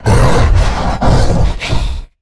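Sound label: Stealth Attack; Evolve; Goliath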